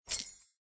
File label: lock Block